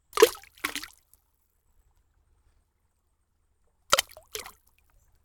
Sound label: Small Water Plops